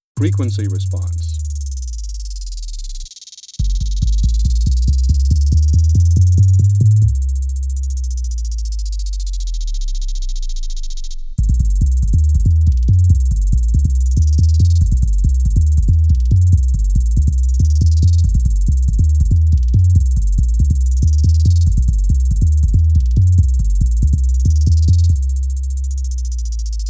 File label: frequency response